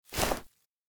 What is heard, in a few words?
pick